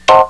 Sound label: dim7th